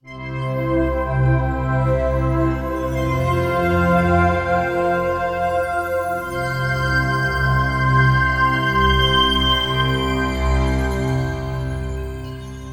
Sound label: Signature Sound; FXHome; Signature Tracks